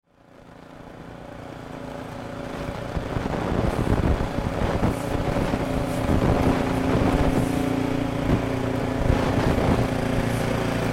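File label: schneepflug, snowplough; vitusbering str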